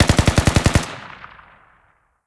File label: Rapid; blue archive